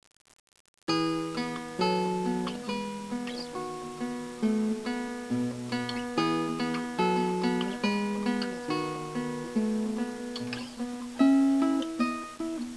Guitar; Lagrima

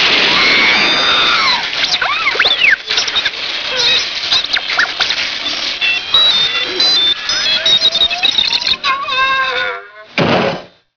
bonk

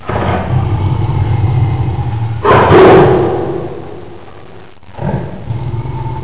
tiger roar

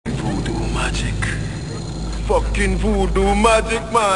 magic

